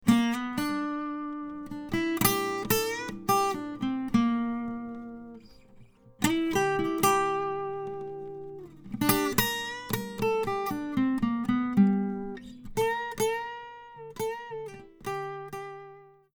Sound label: guitar hole